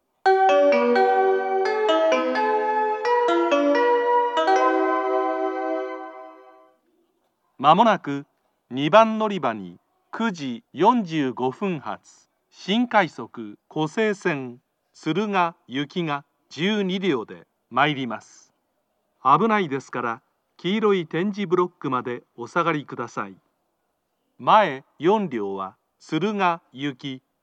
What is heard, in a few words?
special rapid service, kyoto; tsuruga